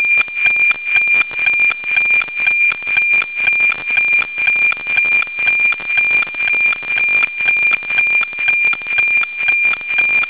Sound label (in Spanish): sonido ejemplo satelite meteo noaa